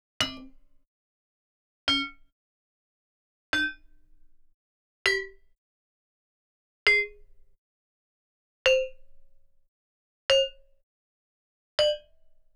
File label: Gamelan; Propeller Island - Complete Gamelan